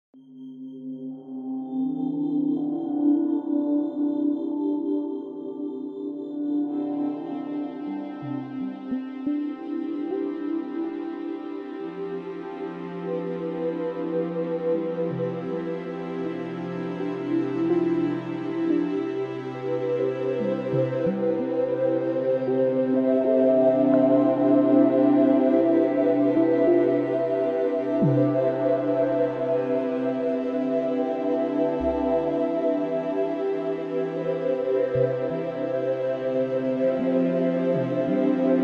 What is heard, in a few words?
meditation